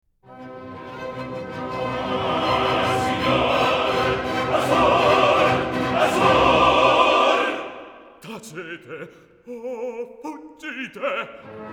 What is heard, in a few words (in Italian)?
recitativo e aria coro; act 2; scena nona; assur